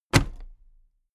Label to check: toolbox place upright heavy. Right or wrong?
Right